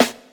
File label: nineties good hip hop snare drum a sharp key, snare sample tuned to the A